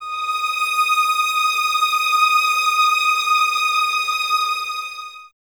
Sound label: Violins Exprsive, String Ensembles; Miroslav Vitous; 11 Violins